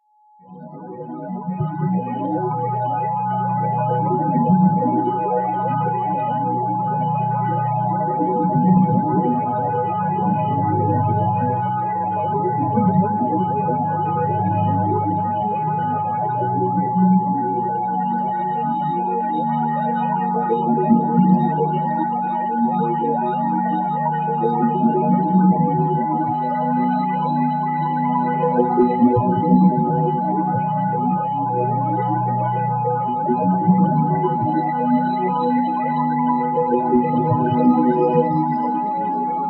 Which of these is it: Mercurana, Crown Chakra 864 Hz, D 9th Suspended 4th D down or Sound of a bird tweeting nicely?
Crown Chakra 864 Hz